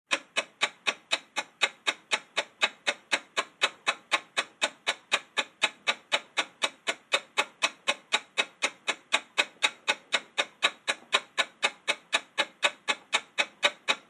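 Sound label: Small Mantle Clock Tick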